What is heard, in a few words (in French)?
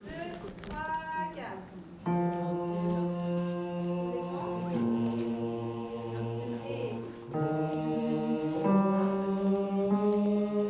basse